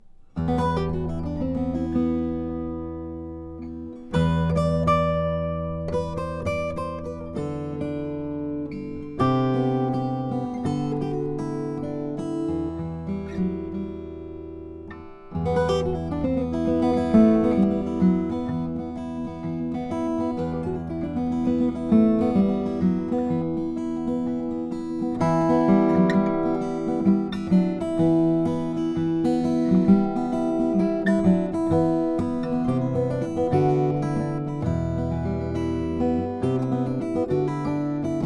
ted thompson t1 dlx indian rosewood, Guitars Acoustic